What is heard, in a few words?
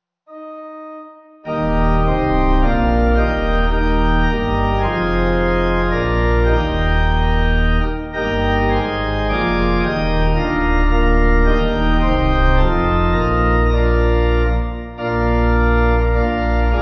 Communion Service The Offertory Pipe